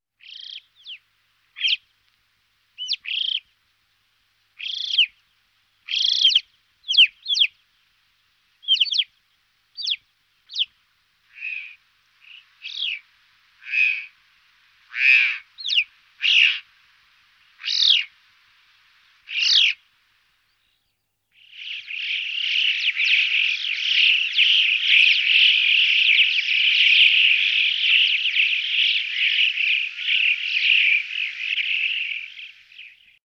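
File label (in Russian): alpiyskaya galka golos, alpine chough voice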